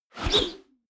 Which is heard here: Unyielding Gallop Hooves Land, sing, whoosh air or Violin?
whoosh air